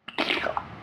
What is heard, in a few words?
sculk block; mcl sculk